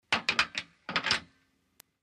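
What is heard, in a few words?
British Std Call Box Rece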